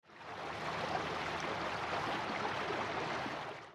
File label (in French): bateau